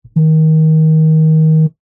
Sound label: household cellphone vibrate wood surface